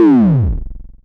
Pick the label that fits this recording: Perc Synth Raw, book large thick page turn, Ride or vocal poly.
Perc Synth Raw